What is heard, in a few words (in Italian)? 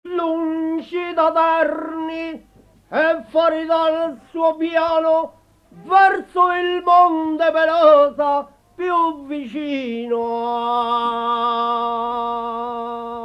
Ottava rima su Polino Umbria